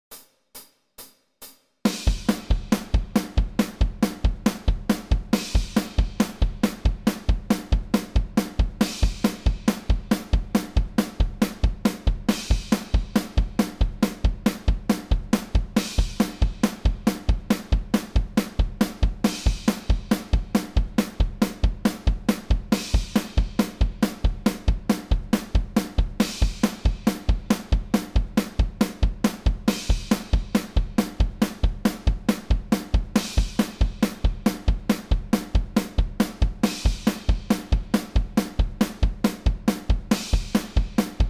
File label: chorus